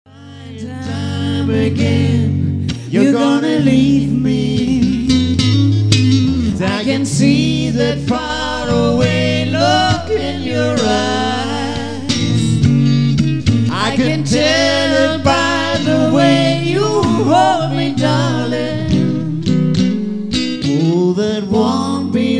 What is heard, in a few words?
crying